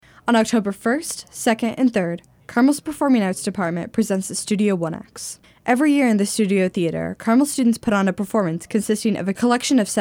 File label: BEAT